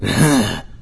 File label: zombie alert